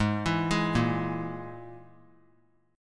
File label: G sharp Suspended 2nd Sharp 5th, chord; G#sus2#5; arpeggiated; JGuitar